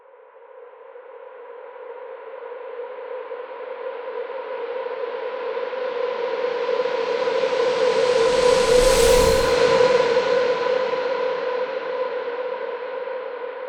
Riser Horror; SFX; Ambient - General

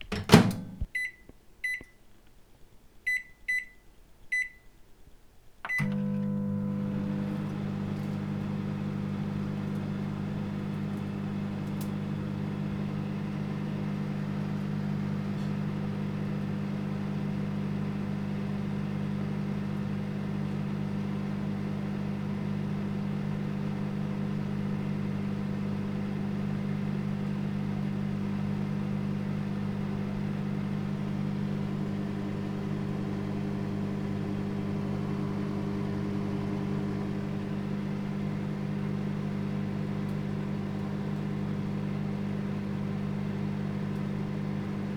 Microwave; SFX; IRL Recorded

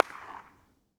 Reverb in front of abondend hospital; SFX; IRL Recorded; Reverb Enviroments

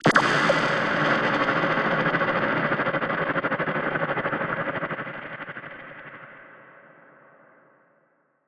Downlifter; SFX; Downlifters

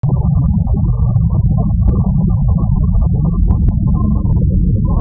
Low Bitrate; SFX

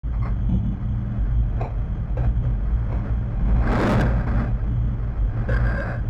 Spaceship; SFX; Ambient - General

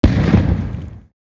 Firecracker Explosion; SFX; IRL Recorded

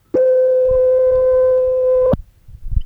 Audio Tape Beep Noise; SFX; IRL Recorded

